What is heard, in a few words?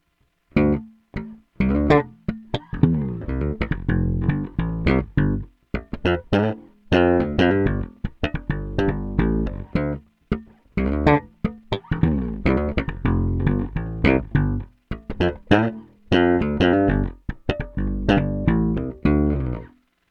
Bridge Slap Tone UP; Peavey based pickup Shootout; Super Neo